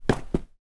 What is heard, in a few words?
clothes basball cap drop on floor